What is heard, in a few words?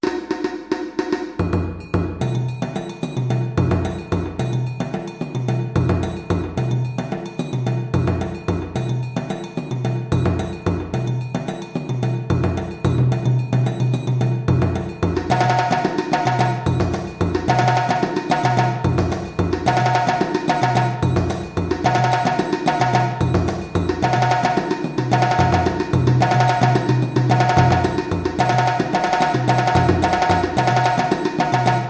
Arrangement 220 bpm, djembe; Denadon